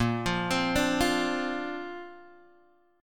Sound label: A sharp Major Add 9th, Guitar, Open G